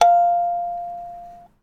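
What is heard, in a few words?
KALIMBA G2; Synclavier Percussion; World & Orchestral; ILIO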